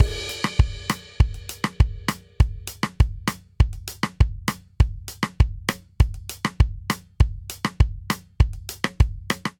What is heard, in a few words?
Reggaeton Verse Variant 100 bpm, Drum; 4 4; MIDI Clips; Ableton; Factory Packs; Drum Booth